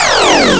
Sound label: Enemy Fall; An enemy falling; zelda